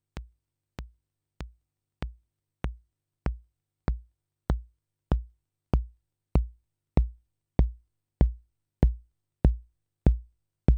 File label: 606 trigger sweep